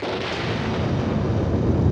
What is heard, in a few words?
Thunder Shower